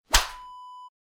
whip whoosh

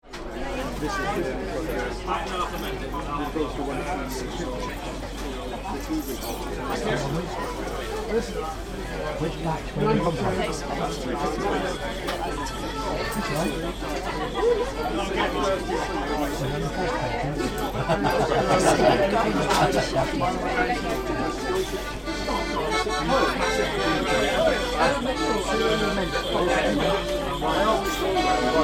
Beer Tent With Morris Dancers

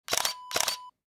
camera shutter

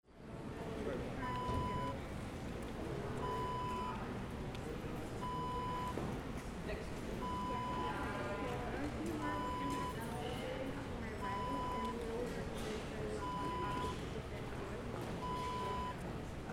museum lobby

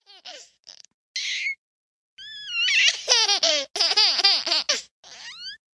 laughing baby

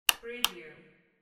light switch

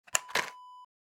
candy box open

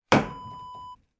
water scoop set down

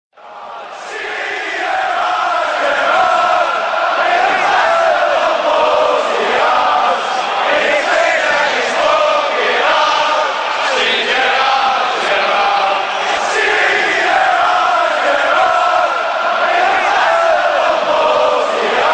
Liverpool steeve gerard gerard, fan chants

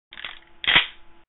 Remington 870

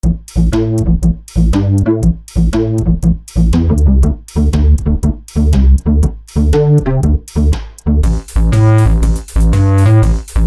electro bass; grandloops

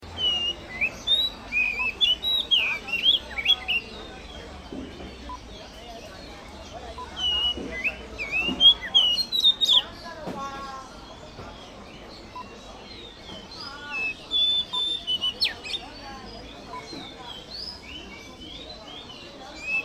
Hong Kong Bird Market Ambience Close up

